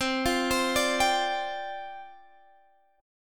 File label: C Suspended 2nd Suspended 4th C Guitar Standard; arpeggiated; JGuitar